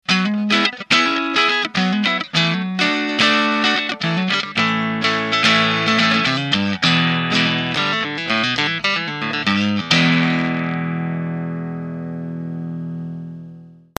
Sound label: Boost Drive Full